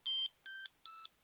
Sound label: ericsson t39 ringtones